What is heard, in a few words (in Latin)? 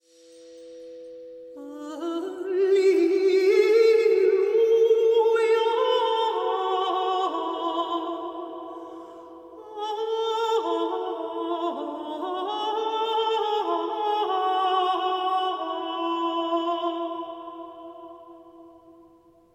alleluja lauda; psalm